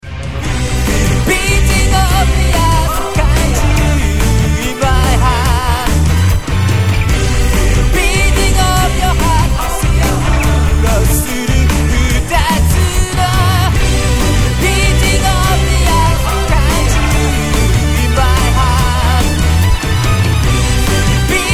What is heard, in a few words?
beat